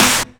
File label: Claps & Snares